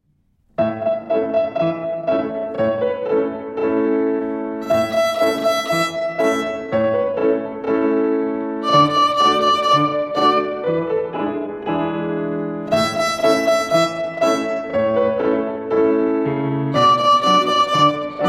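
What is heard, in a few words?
Rhythm on E and 1, Violin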